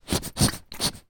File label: household water bottle sports plastic squeeze air water lid end